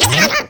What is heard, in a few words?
dark bum payout